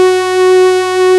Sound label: PAD Geige; Best Service Gigapack 1